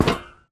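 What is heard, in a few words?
Grunt Movement Footstep Walk Metal Grate; Titanfall 2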